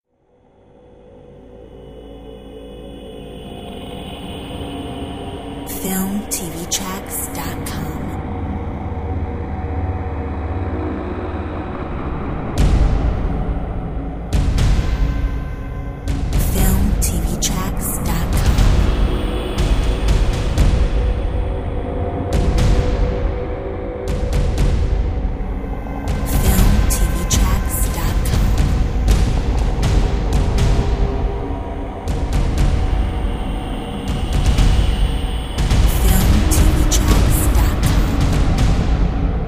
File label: Scary drums